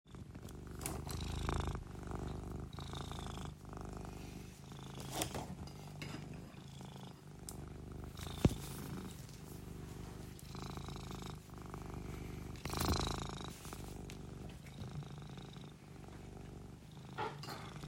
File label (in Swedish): Spinnande katt